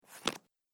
Cards Card Flick; casino; Card Sound Effects

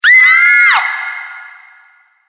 Distant Banshee Scream, Monster Sound Effects

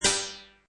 Nosenoise, Sci-fi, short metallic hit; Sound Design

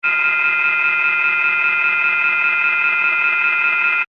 Beeps Data Transmission Technology

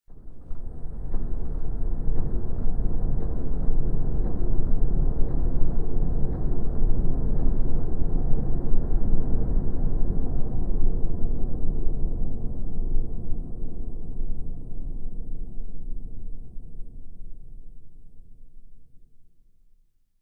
Ambient Rumble; Rumble Sound Effects; sound design

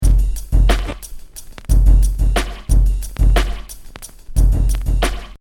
Hip Hop Drum Loop 90 Bpm Straight With Vinyl; Royalty Free Drum Loops; Next Door

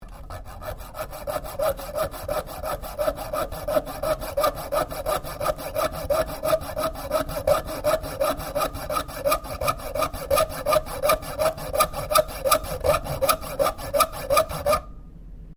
SAW COPING SAW CUTTING STEEL

